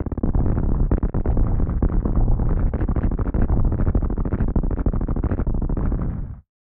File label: The Space Colony Explodes